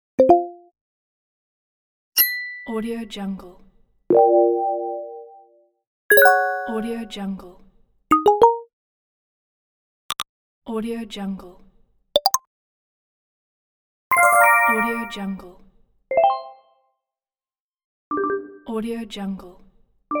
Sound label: notifications pack; jamalwilson